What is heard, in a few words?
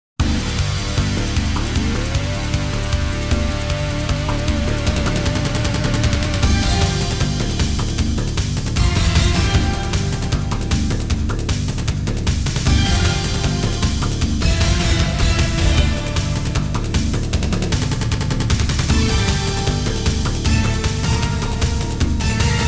Level Boss; panzer dragoon remake ps4 stadia switch windows xbox one gamerip 2020